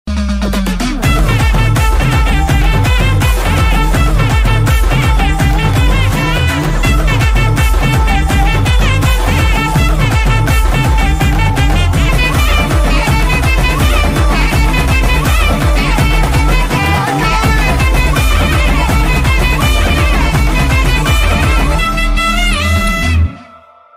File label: song bgm ringtone; thiruchitrabalam